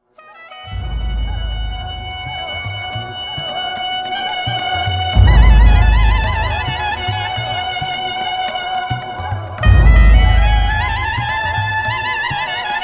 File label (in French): polyphonies corses